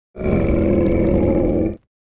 hellhound growl

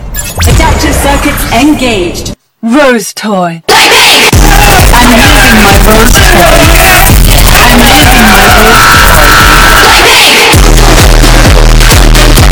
echo

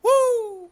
woo, dizzy cartoon

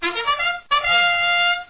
start sound; frc

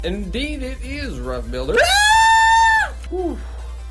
scream

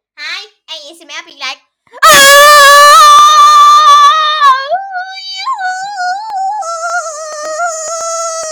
asmr

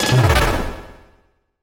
gunshot

